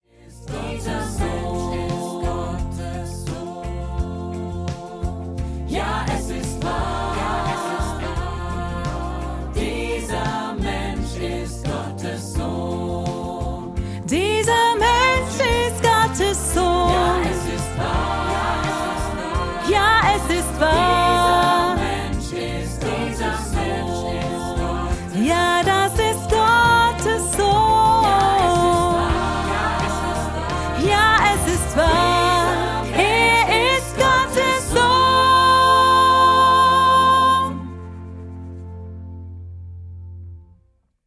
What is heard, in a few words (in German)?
Lern-Sopran2